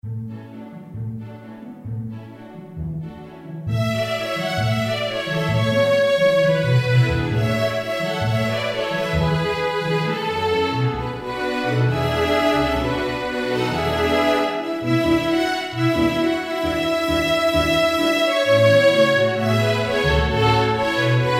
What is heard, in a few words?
string orchester